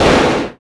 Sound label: CYBER KIT, DRUMS